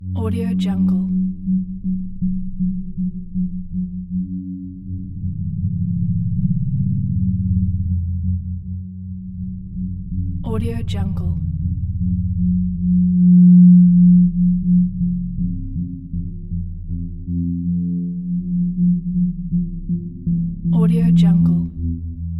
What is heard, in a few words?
scifi pulsation